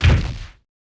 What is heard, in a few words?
Hit Unit; Civ4 warlords